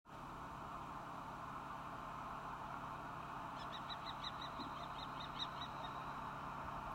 紅隼

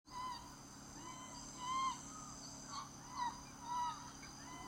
中白鷺

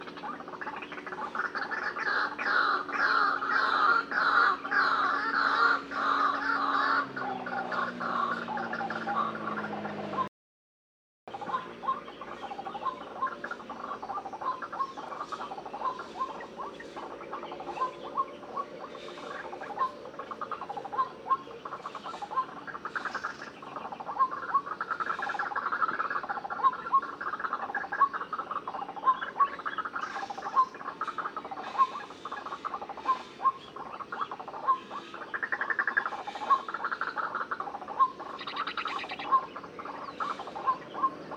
白腹秧雞〔白胸苦惡鳥〕 Amaurornis phoenicurus

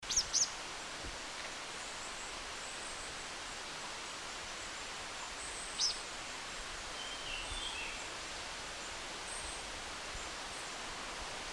紅嘴黑鵯

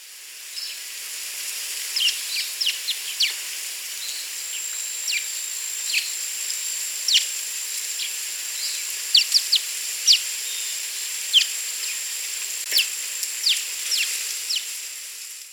麻雀

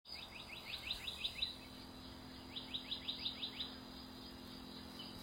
黑枕藍鶲

